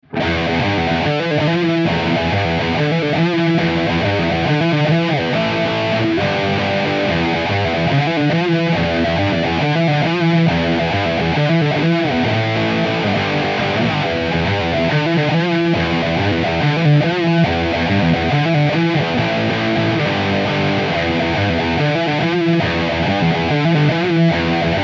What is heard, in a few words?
Lead; Canal lead, Fat 2, Depth 0, Presence 0; Evil Eddie; AMPLI